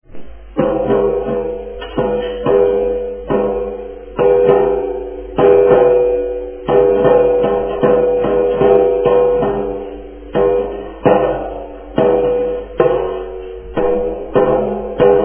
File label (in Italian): tamburo; CHE SUONO HANNO; e la disubbidienza?